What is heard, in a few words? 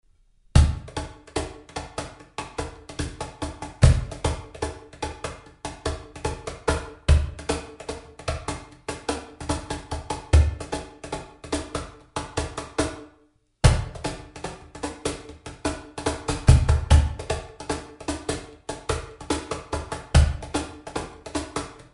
cajon test